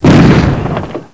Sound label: orb exp; X-men; weapons